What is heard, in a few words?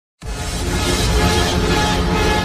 Dubstep Soundtrack; Borderlands 2